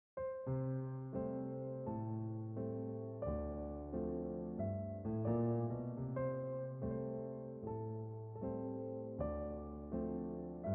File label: JazzWalk, student recordings